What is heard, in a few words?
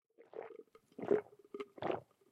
Juice Drink; MiSide